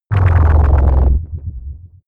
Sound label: energy shield down; energie